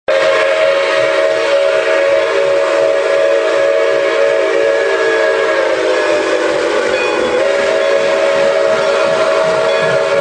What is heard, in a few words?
horn